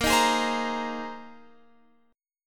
Bb 7th Suspended 2nd Suspended 4th Bb, Guitar Open F; Bb7sus2sus4 strummed